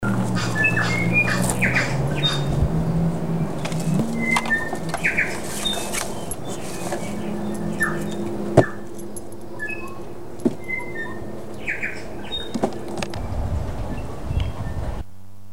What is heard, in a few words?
tui, tairei mouth